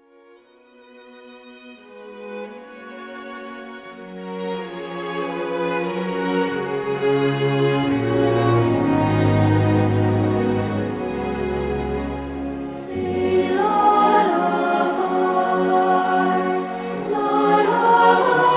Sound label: Sing Lullaby